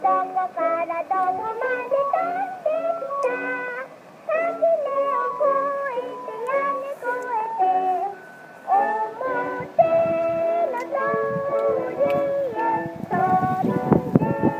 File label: RINGTONE